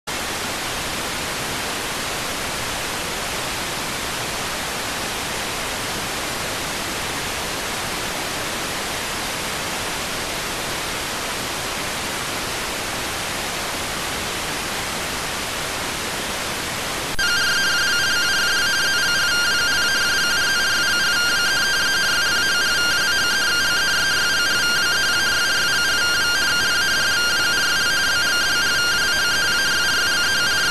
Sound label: rtty rx